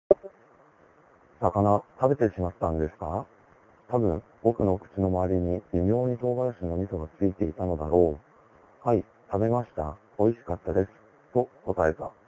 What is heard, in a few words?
throat